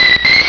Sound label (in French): Cri